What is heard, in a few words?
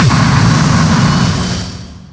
ENGINE